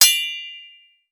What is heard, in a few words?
Sword; Wieldables